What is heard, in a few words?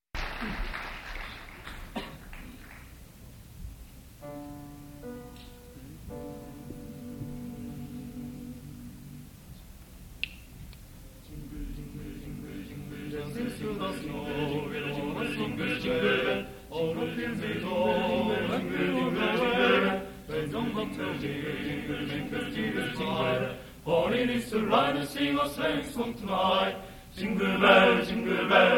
Jingle bell